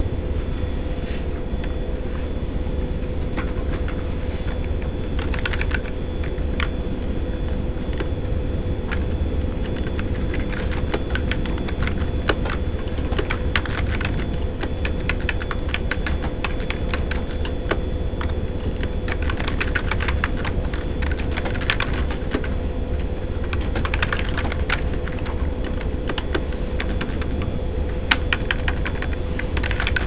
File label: keys